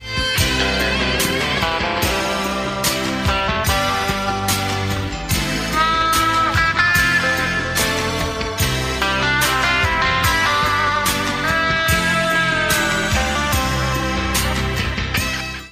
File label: Pensa; noire 87